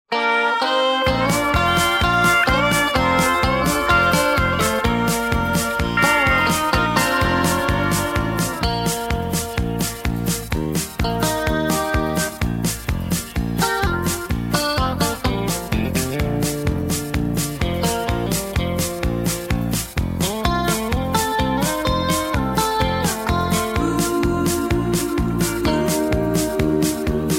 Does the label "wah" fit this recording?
No